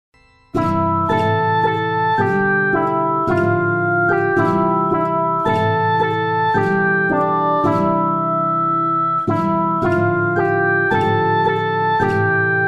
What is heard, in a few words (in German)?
das warten hat ein ende gitar